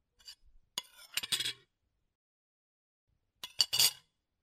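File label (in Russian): положи ложку в пустую тарелку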